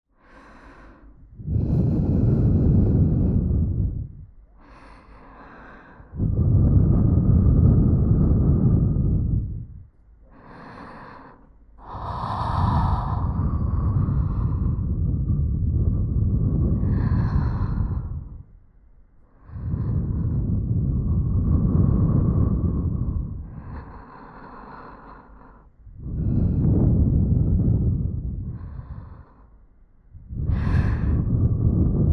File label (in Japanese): 環境音無し 両耳ふー囁き; カリカリ乳首弄り